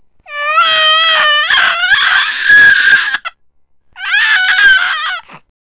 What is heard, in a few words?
waaah